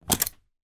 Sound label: household key ring plastic pick up